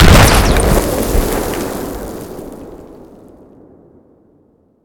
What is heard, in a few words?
rocket explosion